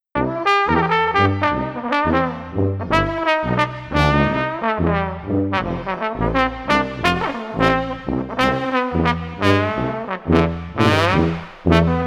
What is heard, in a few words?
Street Trombone Tuba Upbeat no Drums 87